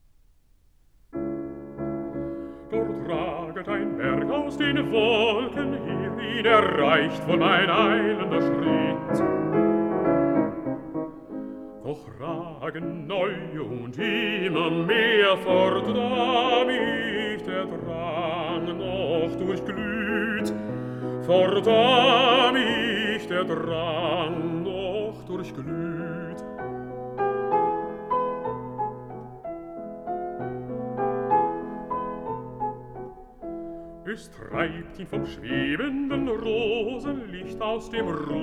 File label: song for voice & piano